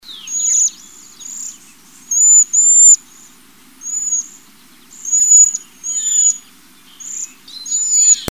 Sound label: cedar waxwing